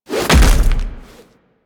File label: End Finisher Landing; Tenno; Warframe; Venus; Orb Heist